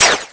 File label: Chain Move Front; Sheik; Zelda